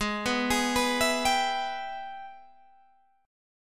Minor Major 7th Sharp 5th, Guitar, Open G; Gsharp; 18,16,18,16,17,17

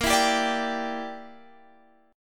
A sharp 6th Flat 5th; A#6b5; strummed; x,13,14,12,15,15

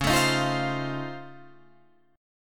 Db Augmented Major 9th, Guitar, Open D

